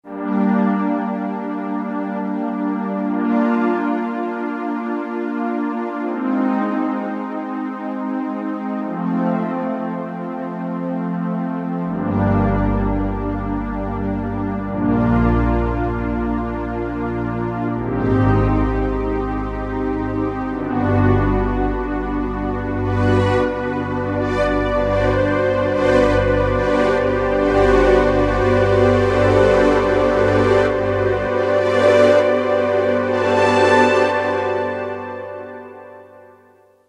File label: deep analog; Roland M-DC1